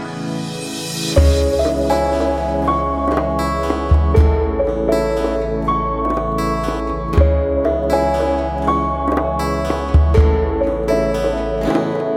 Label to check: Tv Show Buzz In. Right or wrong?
Wrong